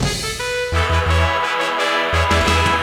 music zombies; suburbination

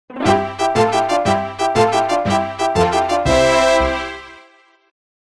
level up; Defence

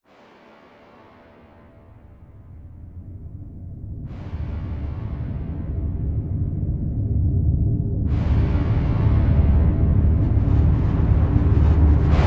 kick; Inception The App

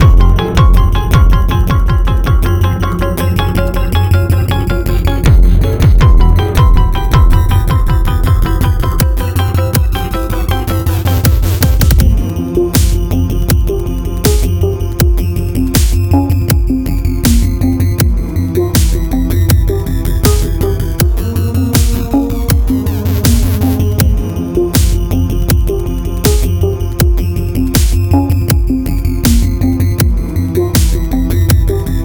Music Main Menu; It Came From Space and Ate Our Brains

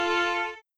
Violin; backpack hero gamerip 2022

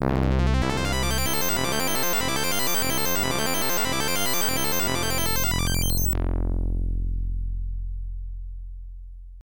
SFX (WARP); dragon knight ii; pc 9801

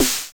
Dance drum Kit Snare; puchicon; dsi ds

